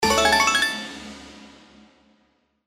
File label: Win Jackpot, SLOTS; Oriental Ink